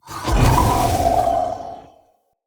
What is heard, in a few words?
Polluter Eye Restore; Props